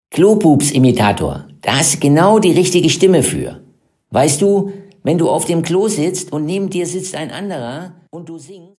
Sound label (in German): Karaoke